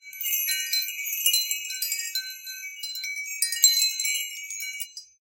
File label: Wind Chimes B; SoundsCrate